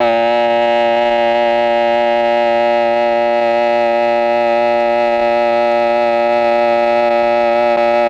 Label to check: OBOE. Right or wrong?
Right